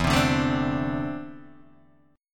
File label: E 7th Suspended 4th Sharp 5th Guitar Open D; chord shape